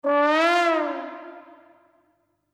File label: Cartoon, transition